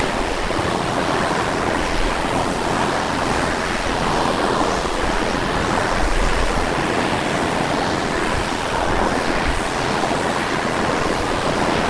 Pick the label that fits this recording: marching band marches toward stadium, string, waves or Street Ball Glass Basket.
waves